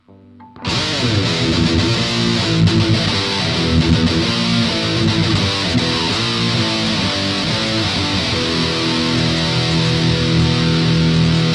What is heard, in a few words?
distors, acoustic electric